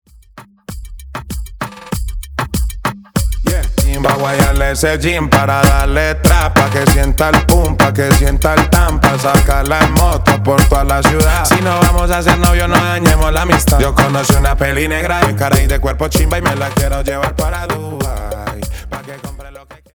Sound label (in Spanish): Intro Verso 97 Bpm